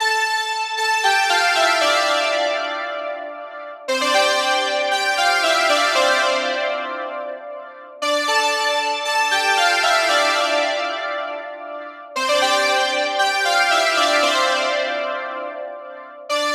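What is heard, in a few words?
Melody 116BPM D minor 80s Classic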